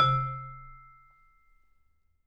V SHRT MF C2, Marimba & Vibes; Sampleheads; Dave Samuels

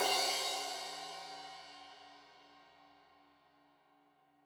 Crash 15in L edge, Cymbals Stereo

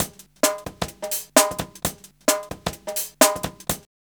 130, DRUMLOOPS

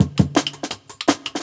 10 Pin Beat; Original Jungle Breaks

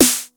TR909 SD; East West - Global Sounds

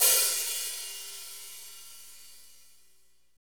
HAT, Roland Fast Rock Hi-Hats; Northstar; Drumscapes Roland

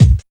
KICK 2; East West - Phat & Phunky CD2; 90's Sample CDs

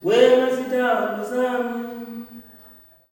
wey oon, male; Spectrasonics; Heart of Africa 2; S.MALEAMB

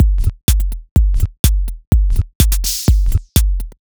125bpm, House Drumloops

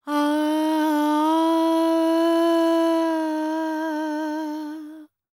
World Voices, INDIA; ILIO; Vocal Planet

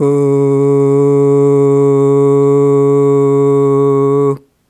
Ooh Long C#2 Dry; Harmonic Building Blocks

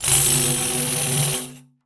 14 PANDE, PANDEIRO

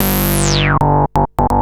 Analoop, Industrial Analog

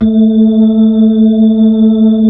B-3, Keyboards of The 60's and 70's, B-3 Brite; Xfade

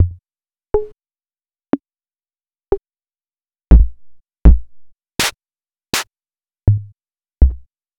Percussion Synth; AJK

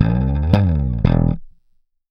Slap, Bass; Bass X

